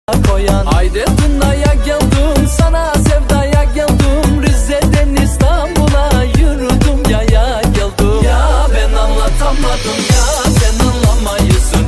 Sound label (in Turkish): Zil Sesi; Populer Telefon Zil Muzikleri